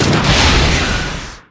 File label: Rocket Launcher Shot; SFX Kit; Weapons